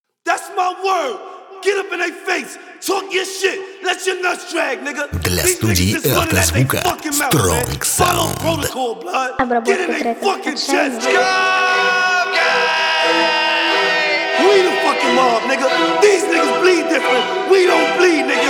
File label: 35-39 Hz Low Bass